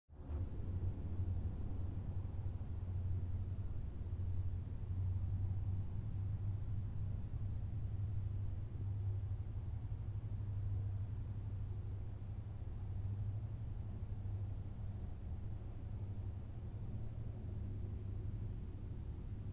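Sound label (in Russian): атмосфера в пустыне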